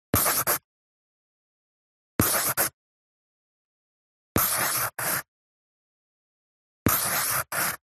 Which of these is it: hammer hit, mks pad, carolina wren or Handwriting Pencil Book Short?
Handwriting Pencil Book Short